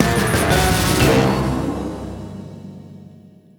Penguin Fail Ditty; madagascar escape 2 africa; xbox 360; ps3; wii; ps2

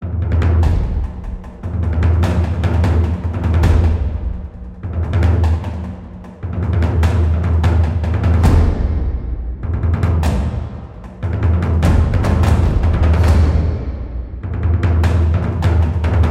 High Intensity Action Loop Percussion Only; Battlefield Bad Company 2

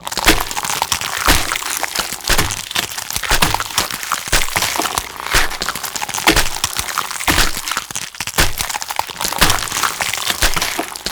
Gore

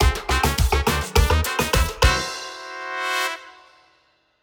lose stinger; mokoko x; Damn pirates

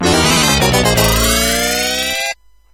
Combo; addams family the bally pinball

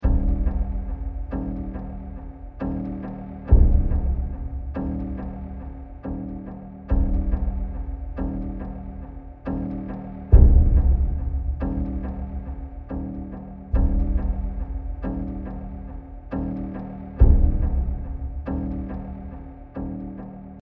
Tension; Lab; Before Stab

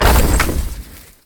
Debris

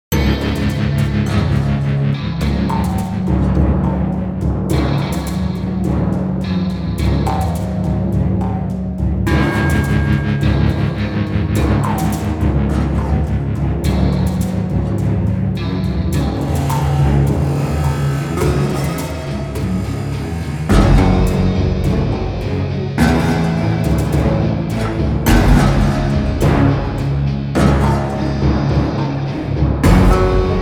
Exploring Guitar

